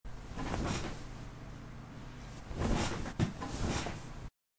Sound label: Bed